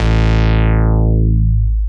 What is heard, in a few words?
Bass Long A; VEC1; Club; Long Basses